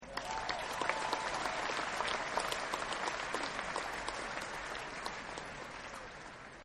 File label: Applause Very Light; Applause Smattering; Sound Effects:Human; Partners In Rhyme